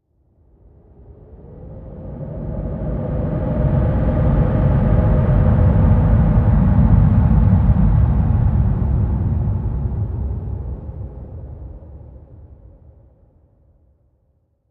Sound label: Rnd Abstract Amb